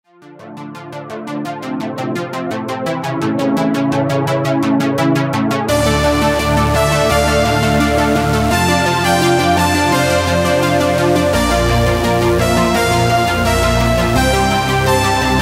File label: SynthWave; Gravity Falls